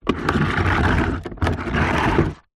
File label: foley plastic pot tub move scrape concrete ground